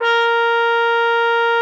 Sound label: A#4, TRUMPET; Xsample VOL-3